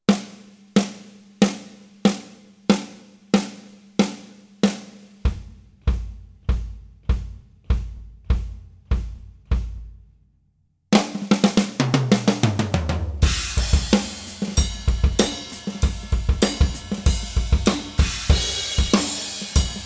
XY int BD SN EQ FX DYN, Zoom H5

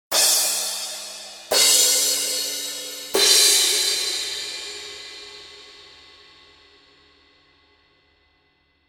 Paiste Crashes

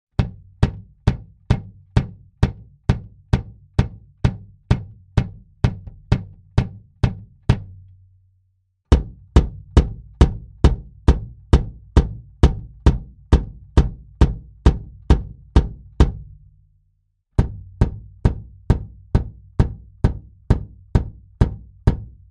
BD Mic Test Pos A all

